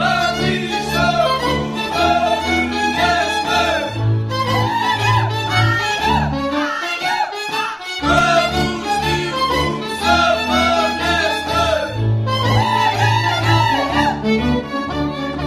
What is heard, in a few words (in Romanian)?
Suita de Jocuri de pa Tarnava - Roumanie